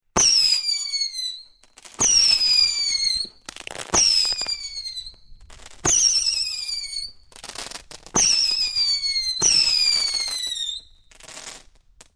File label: fireworks multi launcher screamers stereo